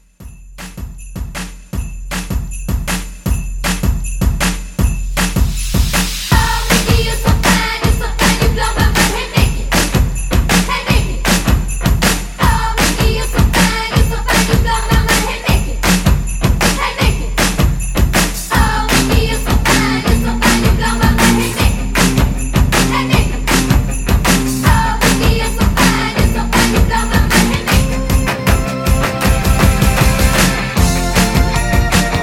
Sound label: X Mas Bells Re Drum 157 CLEAN